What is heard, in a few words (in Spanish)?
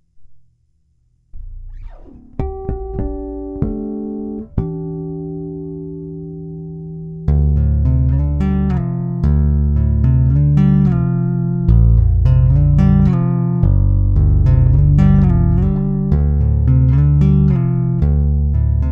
Tapping low hi mid, bajo